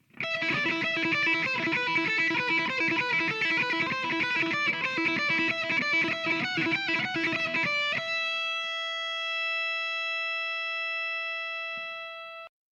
les paul metal solo bridge; Harald König; Blue Note Gitarren Amps

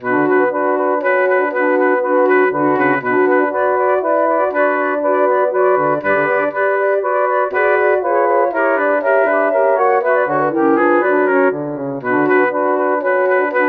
woodwind; UVI

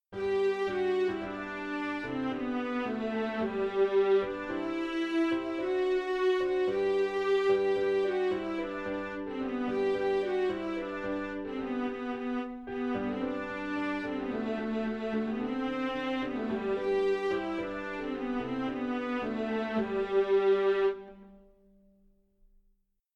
Strings; Sonivox; Film Score Companion; Orchestral Companion Strings; Violine, Viola, Cello

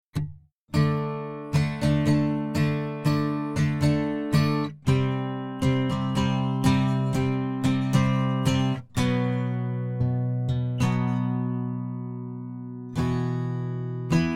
sunbird; AcousticSamples; Gibson

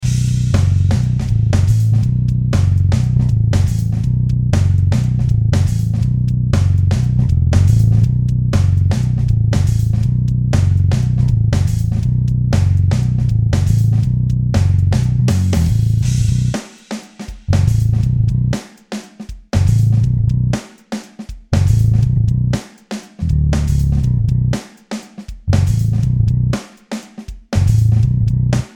Thunder bass; MODO BASS; IK Multimedia

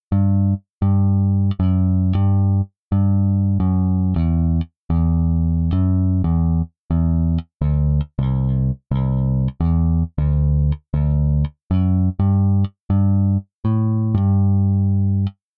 P bass 60; MODO BASS; IK Multimedia

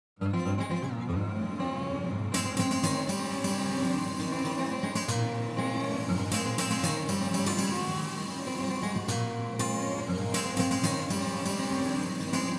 hall; rev ocean; arturia

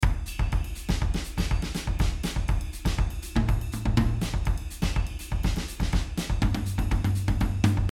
afrolatin, drums; Addictive Drums 2; Producer Pack; XLN Audio

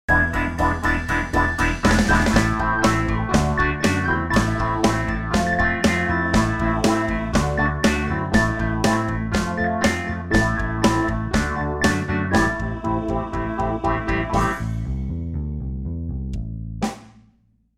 Hohner pianet n

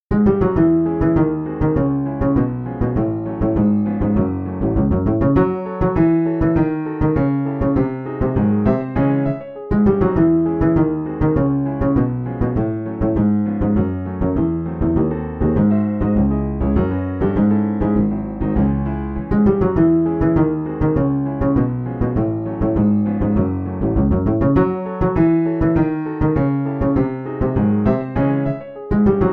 contrabass; String Studio v2; AAS

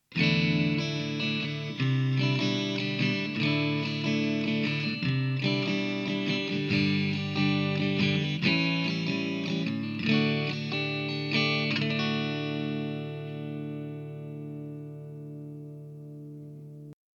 Les Paul rhythm lick middle position; koenig blue note gitarren top; Harald König

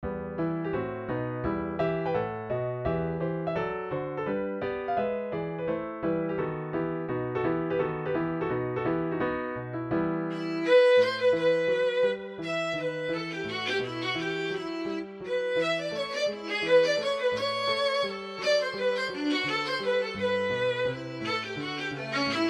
violins; khs; Front Row Violins; Kirk Hunter Studios; Kontakt

